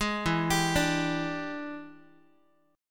E 7th Sharp 9th E down Guitar 4A 4E 5A 5D 5G sharp 6C sharp; E7#9 arpeggiated; JGuitar